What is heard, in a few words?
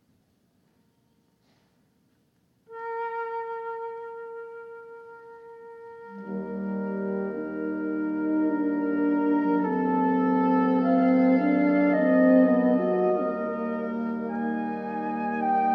Wind Quintet In D Major, Op. 95 - Mvt. 2. Andante Sostenuto